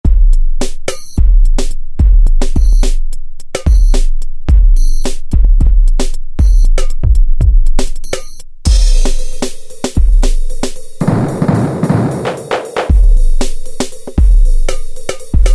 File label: Outer Kit; Factory Preset examples